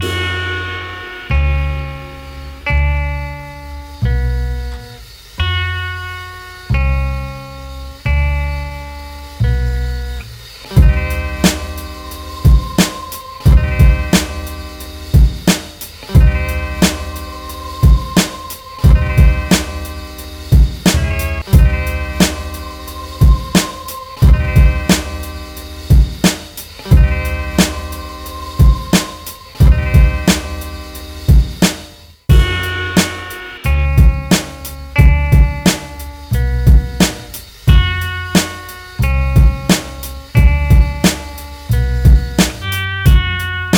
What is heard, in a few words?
guitar